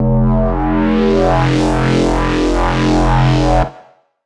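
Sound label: bass sustain dumb F; Polyend; ARC Noise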